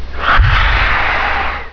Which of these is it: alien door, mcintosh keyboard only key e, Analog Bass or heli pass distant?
alien door